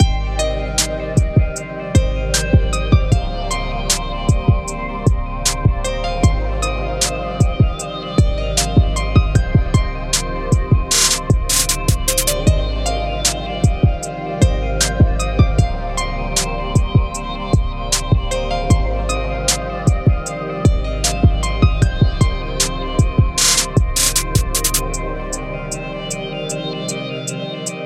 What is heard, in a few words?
helium trap loop